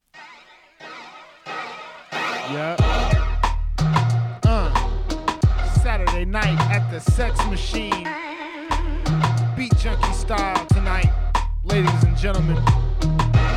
instrumental

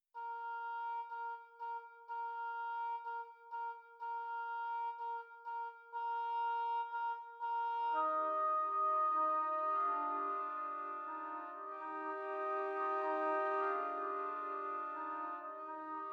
brass

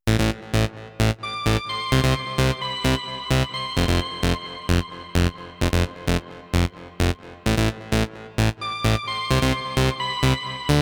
instrumental version

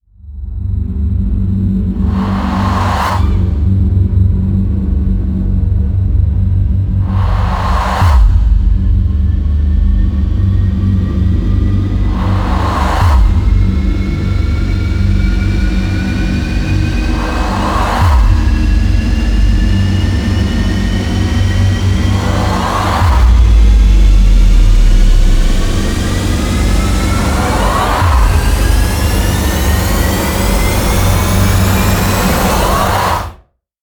riser panic choir

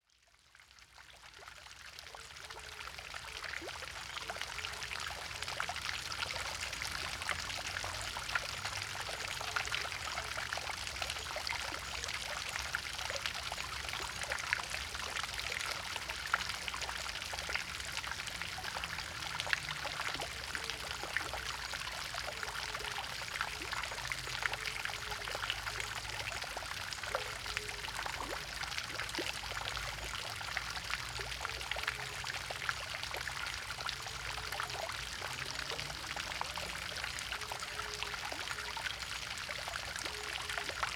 white noise river in park

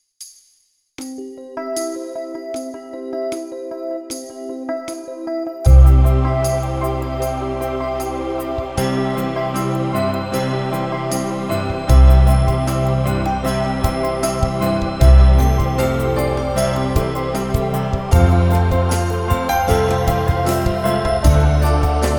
jingle bells chill mix

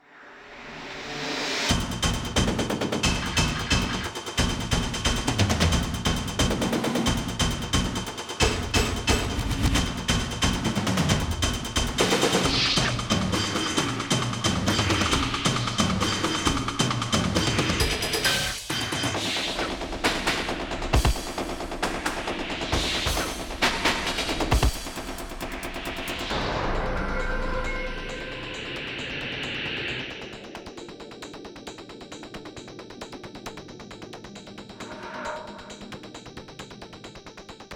drum mix

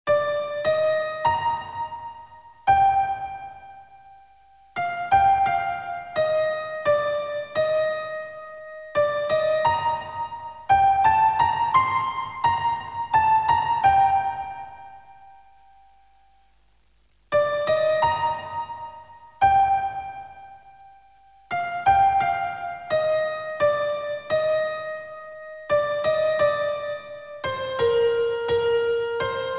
piano solo version

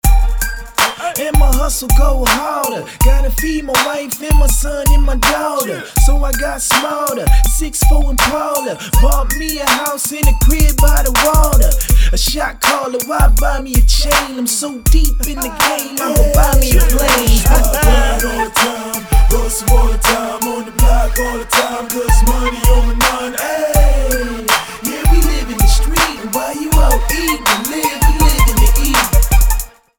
instrumental no vocals

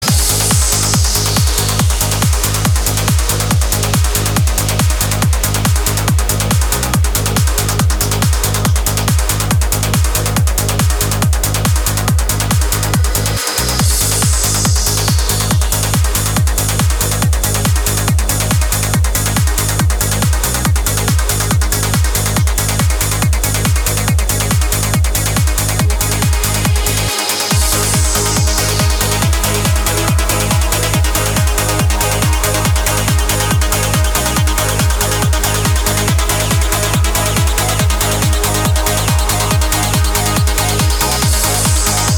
trance remix

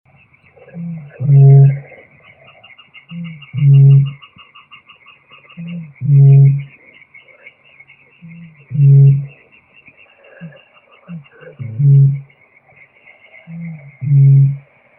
vip bolshaya botaurus stellaris

